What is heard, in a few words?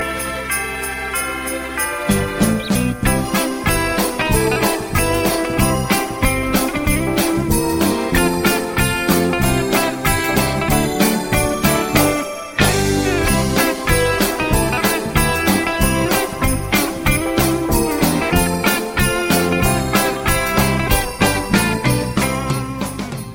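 jingle bells instrumental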